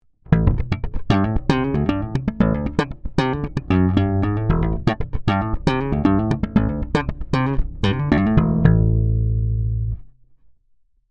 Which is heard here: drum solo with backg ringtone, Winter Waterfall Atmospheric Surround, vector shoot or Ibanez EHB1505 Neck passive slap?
Ibanez EHB1505 Neck passive slap